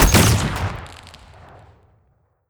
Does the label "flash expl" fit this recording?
Yes